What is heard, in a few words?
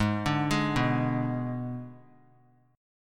G sharp Diminished G sharp; G#dim arpeggiated; JGuitar